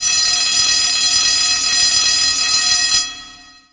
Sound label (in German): Schulhausglocke